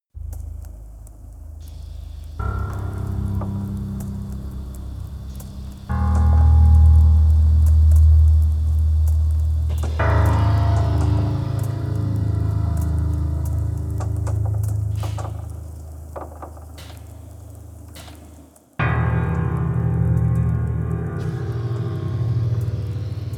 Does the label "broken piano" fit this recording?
Yes